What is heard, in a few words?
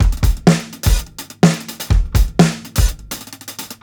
125 16TH ROLLS, DRUMS LOOPS; BASEMENT DRUMS VOL 2